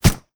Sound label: Firearm Pistol Silencer Shot; Firearms; Zomboid